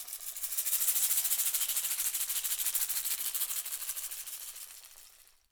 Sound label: SHAKER, AFRCN SHAKER; Voice Crystal - Steve Reid Definitive Percussion